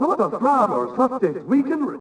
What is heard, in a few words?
walla; DAoC; Toa; Gamestar